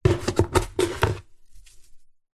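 washing detergent powder scoop from plastic tub with plastic scoop; household; zapsplat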